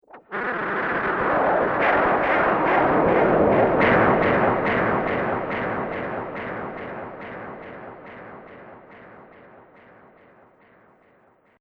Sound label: Magic Surreal Soundscape